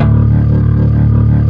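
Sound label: C3, major minor violin trill; East West; Global Sounds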